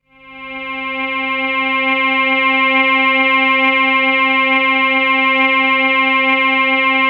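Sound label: PAD C5 left; USB Soundscan vol.05 - Explosive Jungle; AKAI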